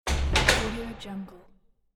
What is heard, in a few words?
closing the door